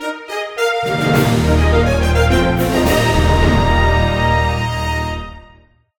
Game Win; Dicetiny The Lord of the Dice

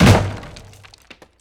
Wood Heavy

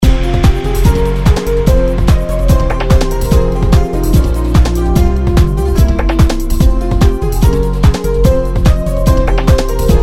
Raid; Mist Train Girls

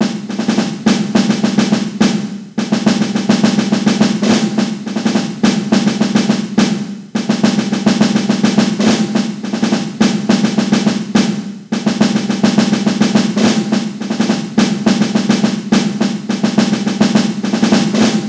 Army Music

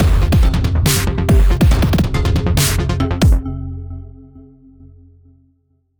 Industrial Action mood

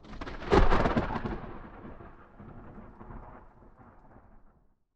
Ambience thunderstrikes; Tricky Towers; PS4

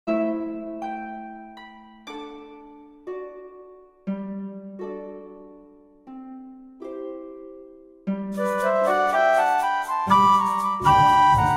The Playful Sea (No Vocals); Princess Peach Showtime

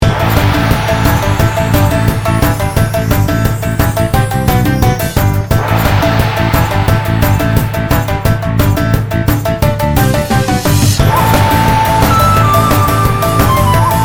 Raid Boss; Mist Train Girls

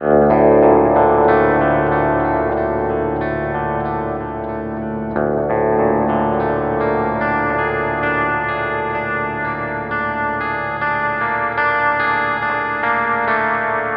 G steel

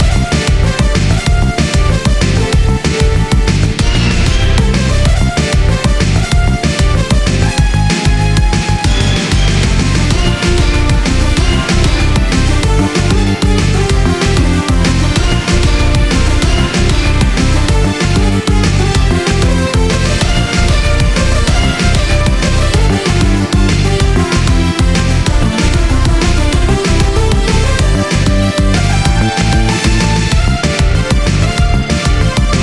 Stage Loop; Optic Circuit

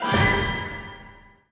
Train Nice; dragon mania legends

